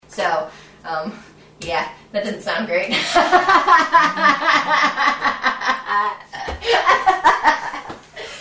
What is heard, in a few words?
laugh